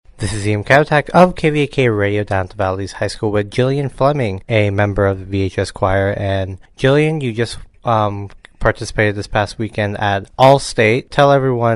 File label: Choir; All State